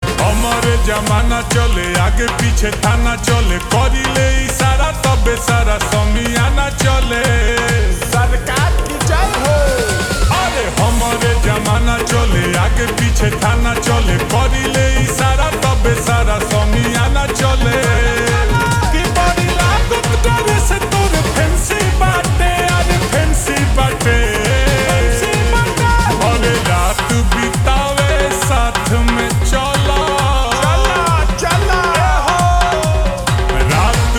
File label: Slowed Reverb